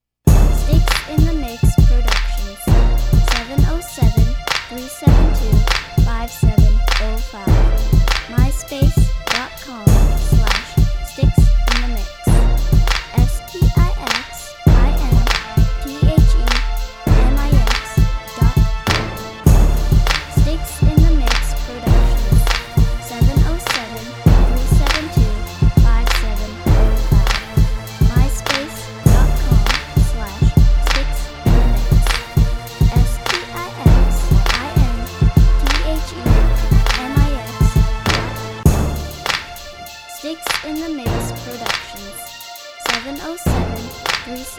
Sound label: strings; memorex strings